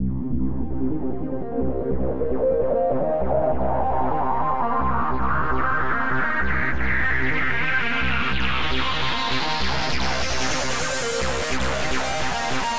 Distortion forest

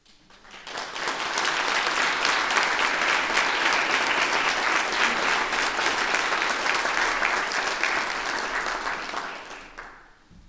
Applause Church

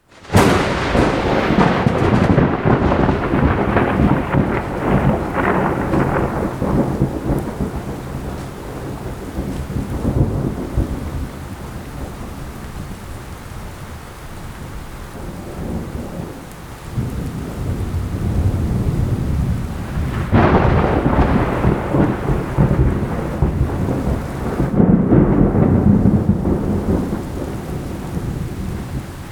Lightning

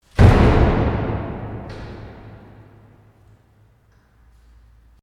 Door slam

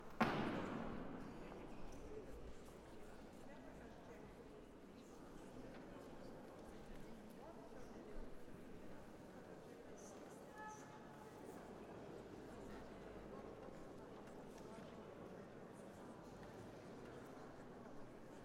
People Church Concert

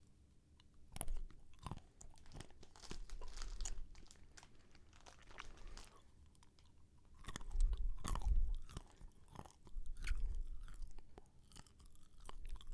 Male Chewing And Swallowing Peanuts

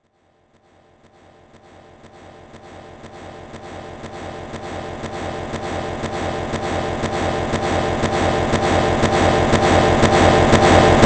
Riser Suspense

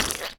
Splat

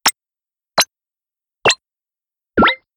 Broadband Bleep

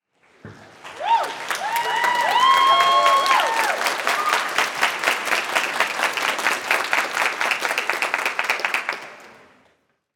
Clapping Large Crowd At Choir Concert

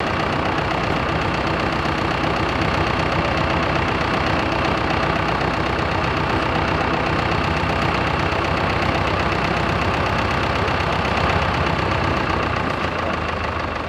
Motor Bote Oman

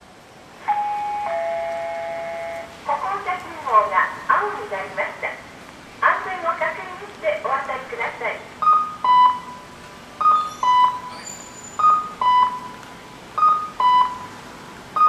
Okinawa crosswalk

